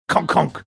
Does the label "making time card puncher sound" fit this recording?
Yes